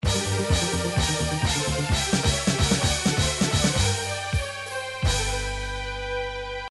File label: game over; Nes Castlevania 3